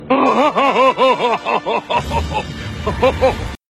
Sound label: Giant Laugh